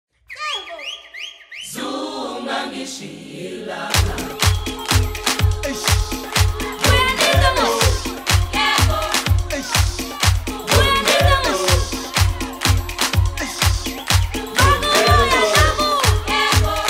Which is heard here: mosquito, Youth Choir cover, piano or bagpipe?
Youth Choir cover